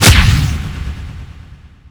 punch tusk; The Monster Plane; kolmods melee

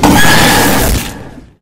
jumpscare; haggy wuggy bot

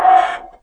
pain; zoochosis

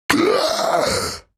pain; skibidi toilets zombies nextbot

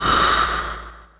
preattack ghost; The Monster Plane; kolmods preatt

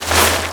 ice blast tick; ice tower pack

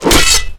dismember swing; The Monster Plane; kolmods melee

